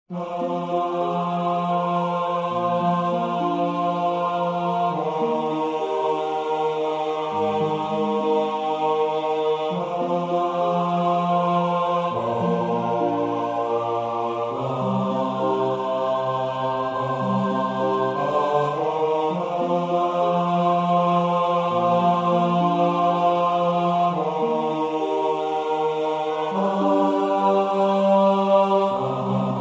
Bass

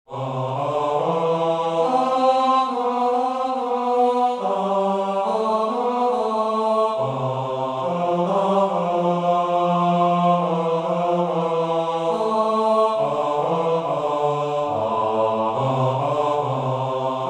Bass

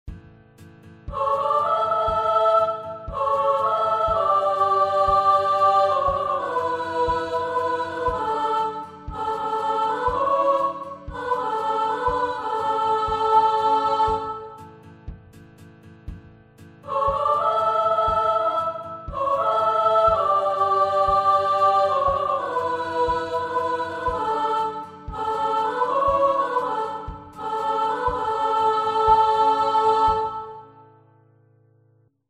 Chorus All

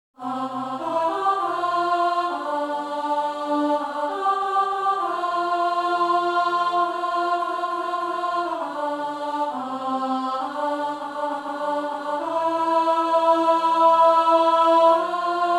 Alto

